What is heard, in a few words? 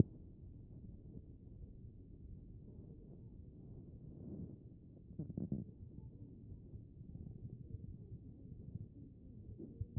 A CHEST; B MODE